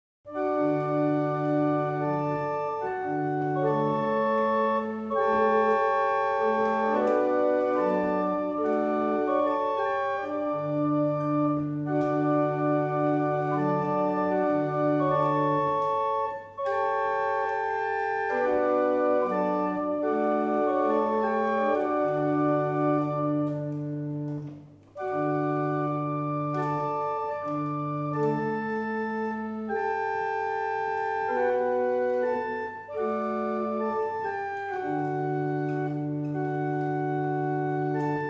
Single chant, barrel organs; Winwick; Humfrey

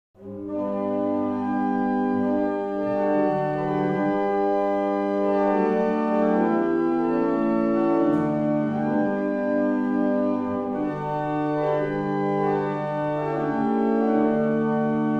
Hanover, barrel organs; Avington

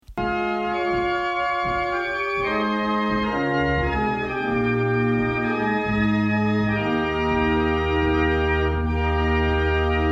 Chant, barrel organ; Brightling

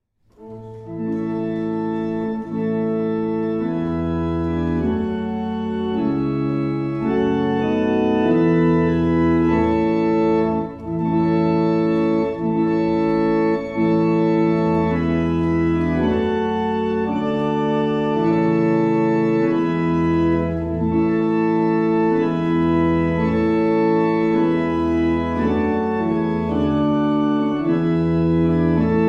barrel organ; Lopham

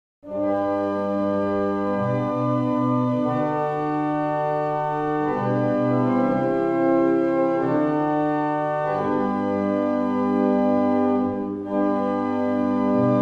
German Hymn, barrel organ; Avington

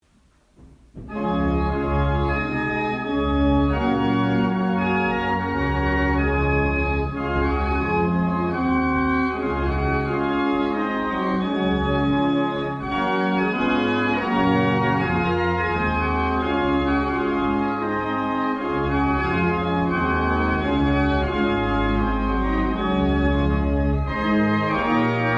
Easter Hymn, barrel organs; Brightling

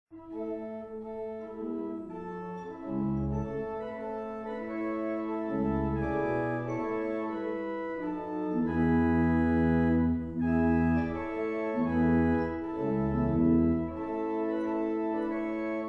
barrel organs; Stanstead

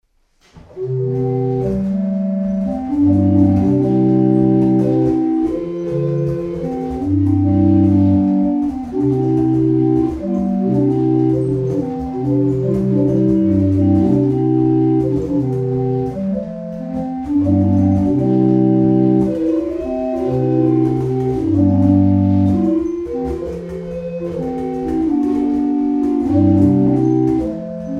Thro the Wood Laddie, barrel organs; Boxford

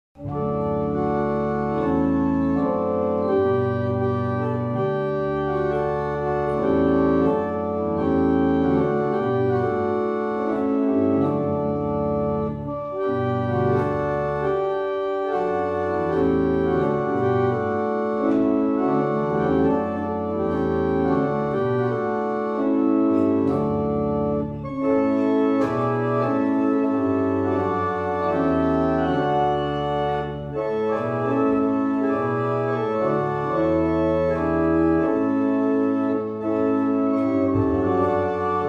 Easter Hymn, barrel organs; Brightwell

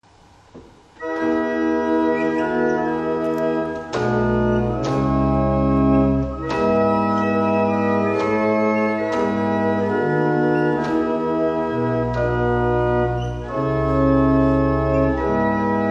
Double Chant, barrel organ; Tittleshall; Mornington

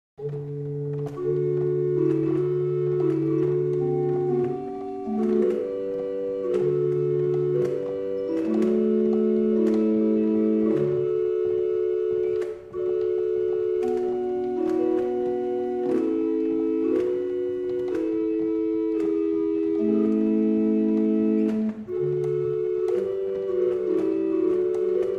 barrel organ; Liddington

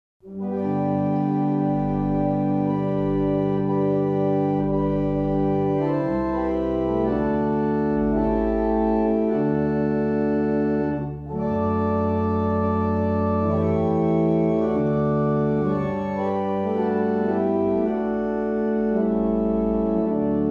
Morning Hymn, barrel organs; Sutton Cambs

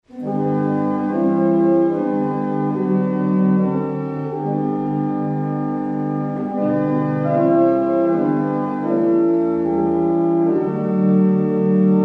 barrel organs; Barnston; Quam Dilecta

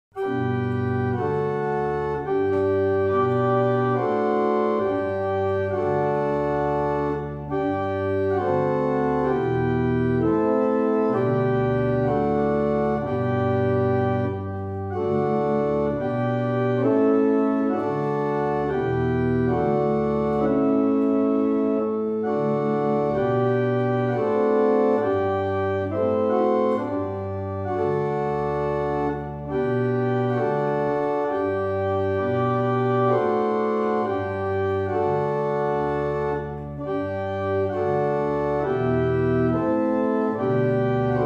barrel organs; Brightwell

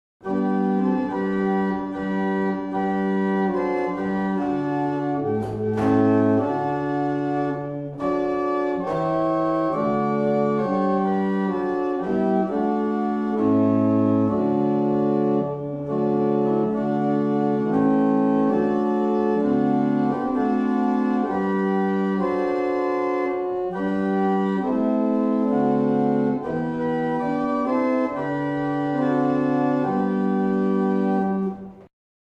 Morning Hymn, barrel organs; Mattishall

